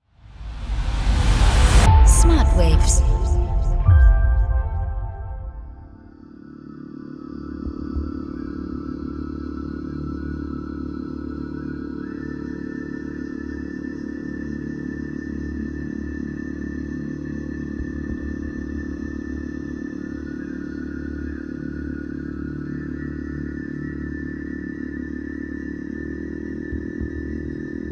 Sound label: Throat Overtones; gamma all in one